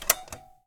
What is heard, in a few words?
switch on, Buttons and Switches; 3maze